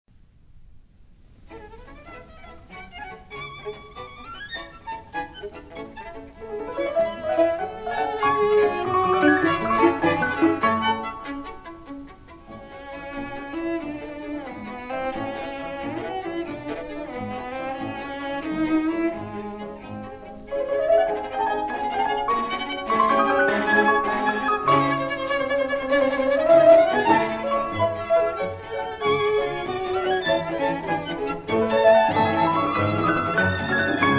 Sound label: Piano Quintet in A Scherzo Furiant Molto vivace; Dvorak; Op. 81